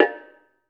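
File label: BONANG, Metalophones, Pitched Percussion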